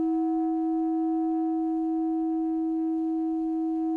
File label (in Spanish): Multiarmonicos